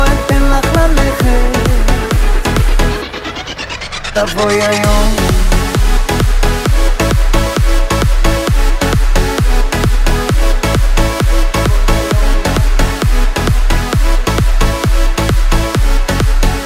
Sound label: Hip Hop